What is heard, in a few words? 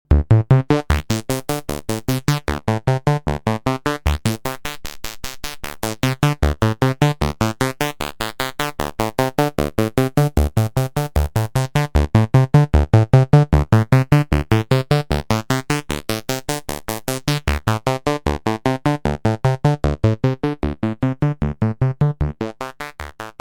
One Saw Three Bandpass